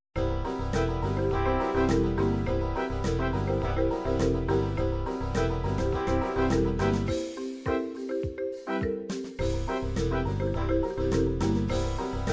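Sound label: dance party pop, midi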